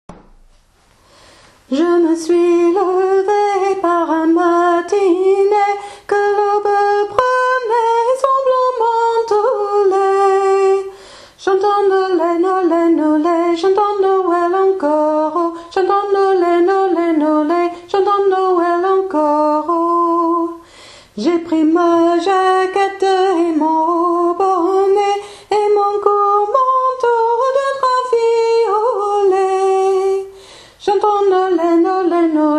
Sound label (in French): voix principale; chantons nolet